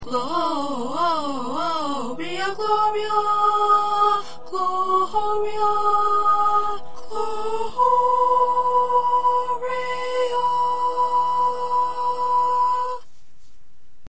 High 1st Tenor

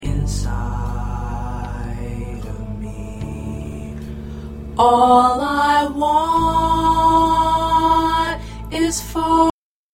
Low Voices

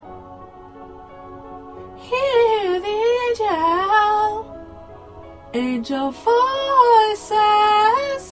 Background Soprano; part preview

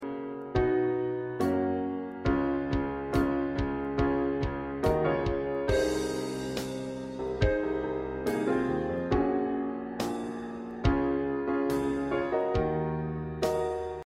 Piano Drum

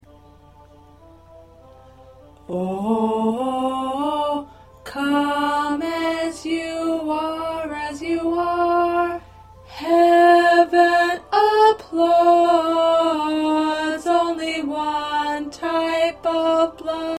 Alto

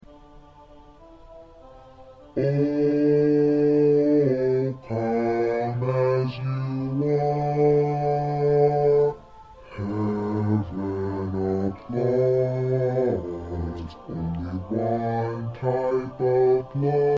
Bass

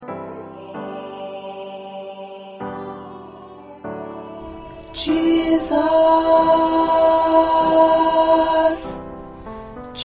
Alto, choir

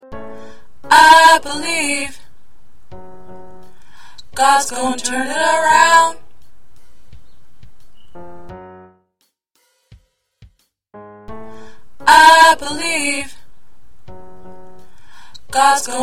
2nd Tenor

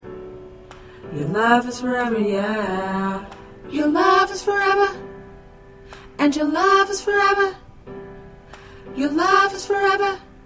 Soprano, choir

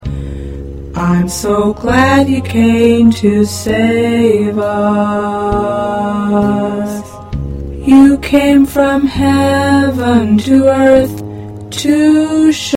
Tenor, choir

